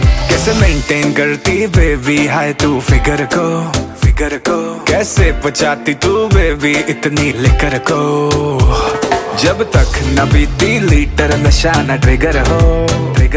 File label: Ringtone